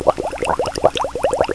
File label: bubbling